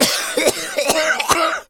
smoker idle

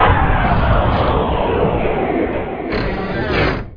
battlelord death

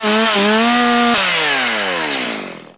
chainsaw swing

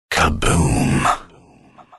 powerup nuke

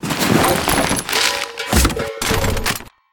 mystery noise

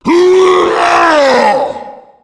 tank throw

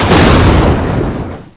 thundergun fire

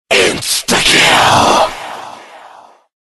powerup instagib

